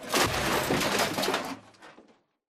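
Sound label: Stalker Nuke Destruct Explosion Metal Debris; Titanfall 2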